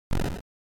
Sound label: General Noise Single